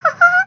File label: Ribbit Frog Hat Idle Voice Bits; creatures organic